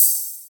open hat f sharp key, Royality free open hi hat sample tuned to the F# note. Loudest frequency: 11135Hz